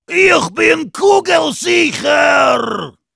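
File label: Heavy yell, German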